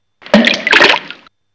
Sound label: splash